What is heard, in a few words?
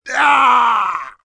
death; barb